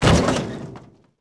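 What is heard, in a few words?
impact metal hollow; Cars; XBOX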